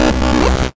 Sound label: Bass